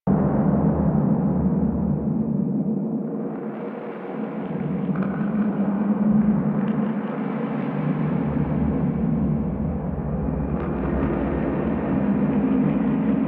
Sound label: Ambient Loop, ambience; Warframe; Sacrifice; Vitruvian Memory